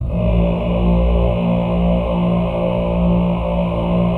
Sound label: Choir Ah